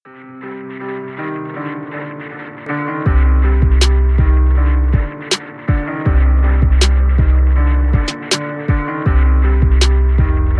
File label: instrumental